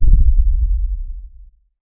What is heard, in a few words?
a deep low thud sound; authoritative